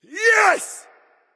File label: "yay"; smite; merlin